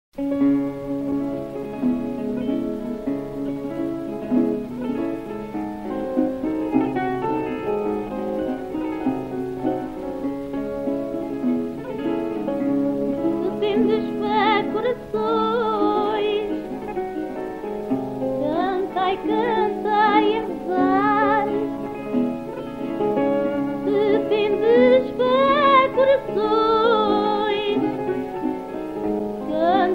Fado em ré menor

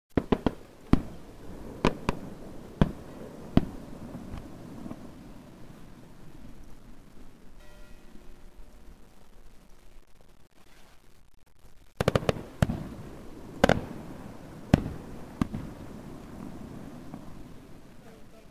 Marcha do arraial ao vivo no arraial; ao vivo no arraial da Senhora das Candeias

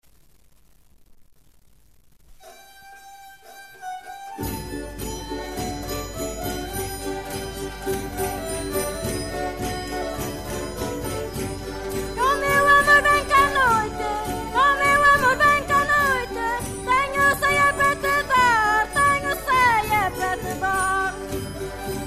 Baile Corrido

